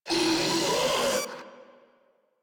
Proto Infested Ancient Player Spotted, Enemies Vocals; Warframe